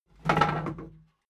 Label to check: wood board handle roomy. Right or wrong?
Right